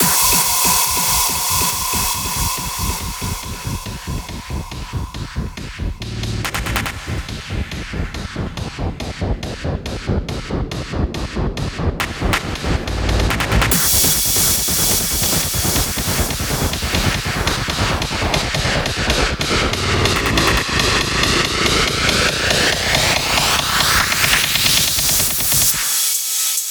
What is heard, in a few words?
140BPM 16Bars, HandsUp Trance Fills; Vengeance Samples